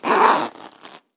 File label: Zipper Zip